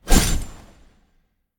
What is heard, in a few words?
swing; Smite; god Khepri